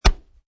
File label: Chest Punch